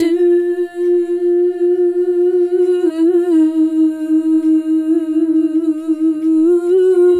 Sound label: Female Group, Hip-Hop and RnB Vocals